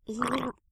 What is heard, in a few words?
female drowning; zps; Humans